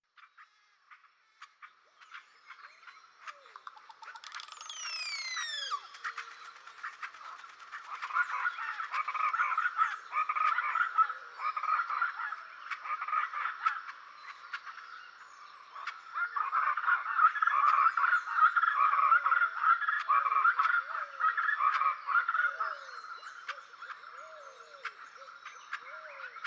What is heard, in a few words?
rainforest songbirds; Nature's Many Moods